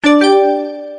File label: chat message